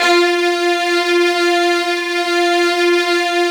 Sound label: STRING PIZZA; SONIC ELEMNT; SONIC EL YES